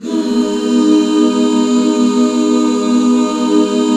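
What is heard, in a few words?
G# minor, back chords; Sound & Vision - Gigapack II CD 1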